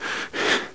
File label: Breath Heavy; Player; Heretic II Demo